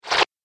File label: App Game - Sticky Splat